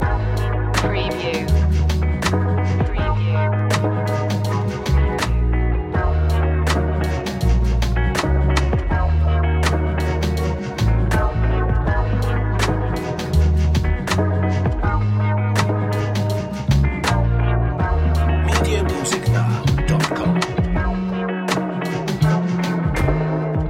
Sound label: abstract memories loop